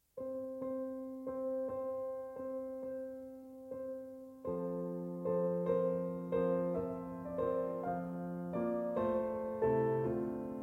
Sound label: Ballade No. 2 in F Major